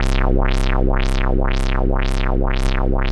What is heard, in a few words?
BASS C3 L, Jungle; JGL BASS; USB Soundscan vol.05; Explosive Jungle; AKAI; 90s sample CD